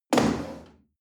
chair wood place slide hard

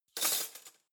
cutlery spoons drawer place

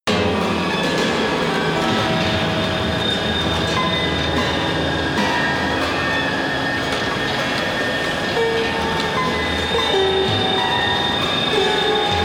broken piano chaos

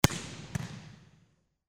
volleyball single serve

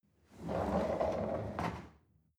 chair wheel roll on floor soft

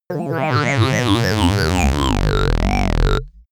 landing ufo

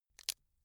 bamboo small break medium

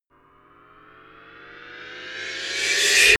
reverse hit metallic

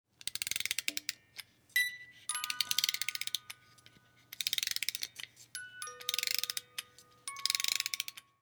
music box winding up

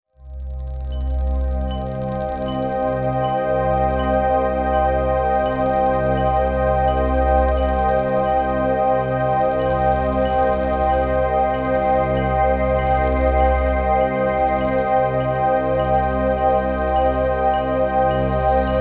cosmic synth pad

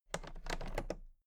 toolbox latches open very soft

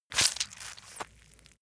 leather impact mud soft foam

